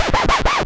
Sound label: SCRATCHES